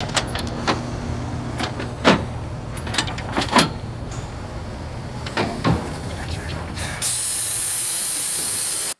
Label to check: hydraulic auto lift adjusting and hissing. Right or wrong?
Right